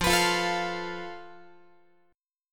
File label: F Major Flat 5th, Guitar; strummed